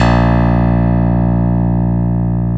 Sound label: PNO, Electrics, Grands; Club-50 - Foundations